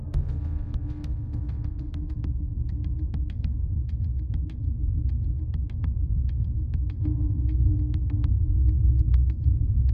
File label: suspense music